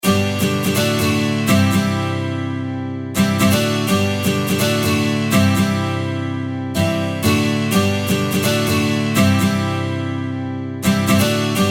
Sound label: Guitar, ringtones; Vertex Impress M